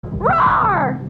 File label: dinosaur telephone call